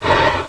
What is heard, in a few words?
gonome pain